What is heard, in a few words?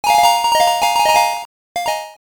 coin shower